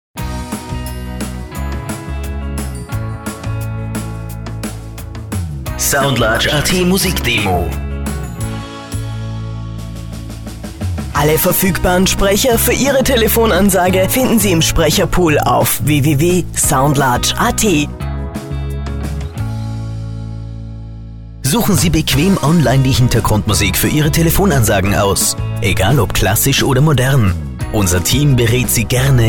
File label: Popular Rock